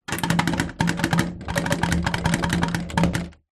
household door internal bathroom rattle try to open